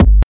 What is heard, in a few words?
prominent steel kick drum g sharp key, kick drum single shot tuned to the G# note